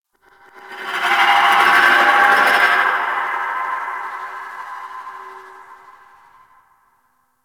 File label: Misc One Off, Ambience; Warframe; Tenno